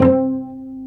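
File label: strings cello pizzicato, dry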